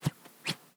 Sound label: Whistle, Organic Wooshes; Micro Pack